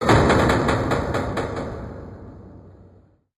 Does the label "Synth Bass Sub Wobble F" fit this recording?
No